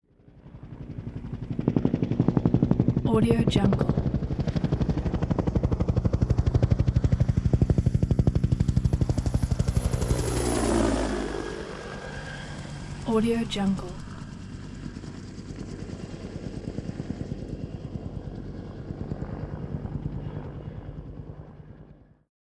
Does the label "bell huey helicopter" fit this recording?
Yes